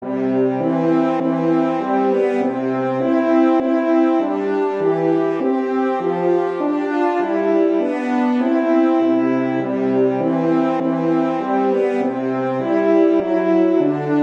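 Hark! The Herald Angels Sing, Christmas Horn Duets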